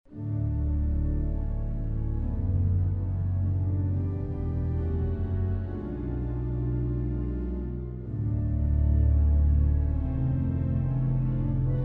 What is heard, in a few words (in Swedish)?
Andante sostenuto, D-dur